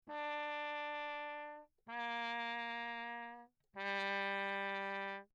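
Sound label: trumpet mute Lechner low; Perinétventiltrompete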